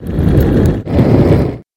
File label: monster flame